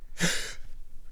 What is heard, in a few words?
breath in!